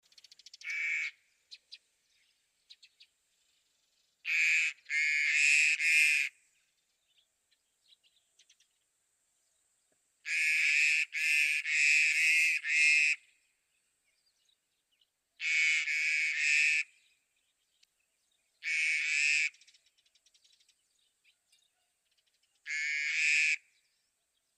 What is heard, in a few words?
Starling Sound